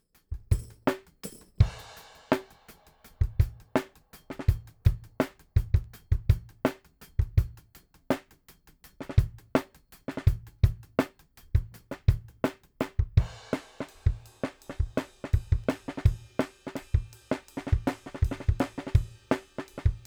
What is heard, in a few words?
whole mix no subkick